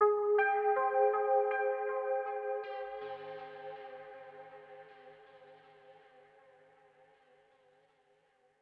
Overlay Tone; Savant Extraordinaire

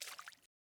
water step

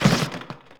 Window Shade; The Princess and the Frog; wii windows; Hub Diner; Light Street; Window Shade Close

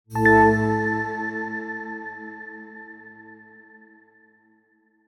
Level Clear

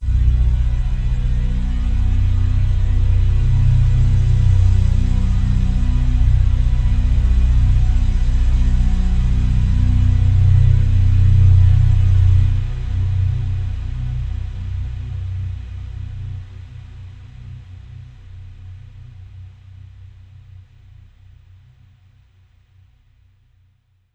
Overlay Drone

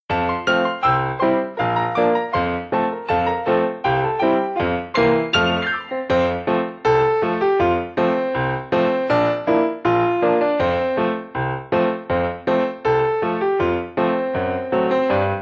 Piano; baron fur is gonna fly

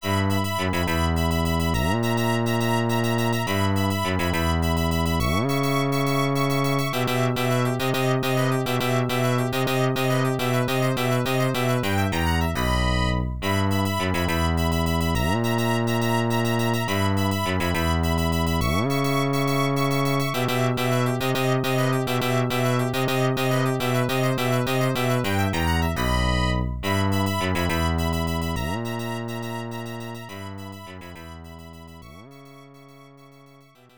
Unused BGM

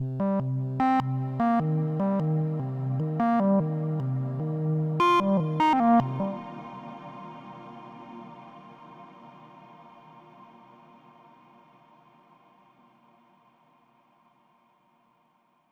Overlay Tone; Benefactor; Mirror's Edge Catalyst

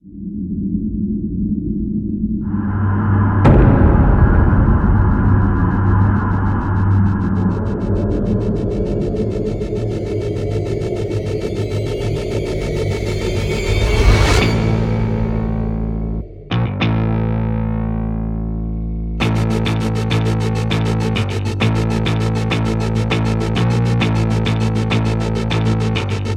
TENSION

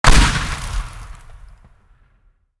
explosion

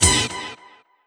News - Stage Stinger; Inkopolis News

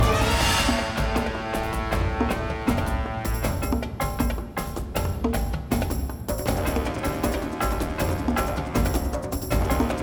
Music Ingame Boss Final Mutant Caveman Loop

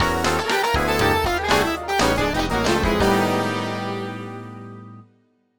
stinger Lose; super volley blast

